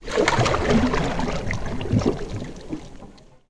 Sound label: Canoe Paddle Stroke Underwater